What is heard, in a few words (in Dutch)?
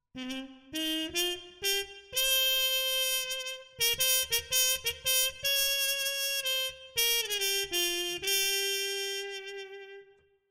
Harmon mute trompet